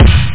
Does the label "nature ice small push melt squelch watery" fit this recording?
No